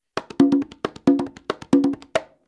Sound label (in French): roulement ternaire, congas